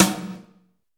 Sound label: long room reverb steel snare drum g key